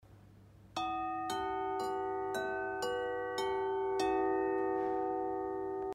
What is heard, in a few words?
Harmony Bell E4 E5; Yellow